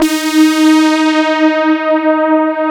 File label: CMI PIANO